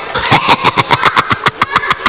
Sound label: suicide laugh